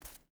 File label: footstep barefoot concrete walk; Rust